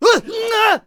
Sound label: Samurai Attack Vocals